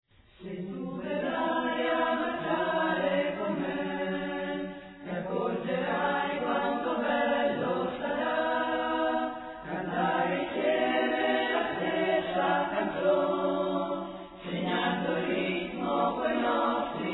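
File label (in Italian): Se tu verrai voci femminili; CoroLaTraccia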